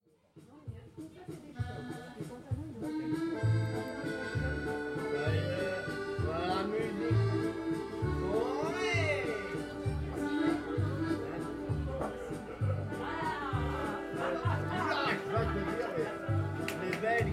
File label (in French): ambiance arrivee